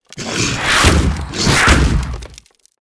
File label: lancer satk; monster